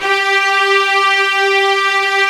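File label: strings, bright strings; String Master Series; Combos 2; Bryt Strings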